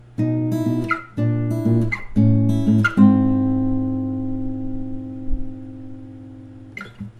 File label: Lick